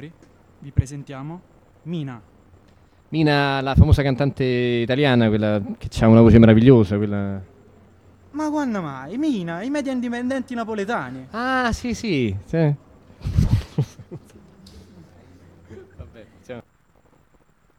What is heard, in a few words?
jingle; radio di massa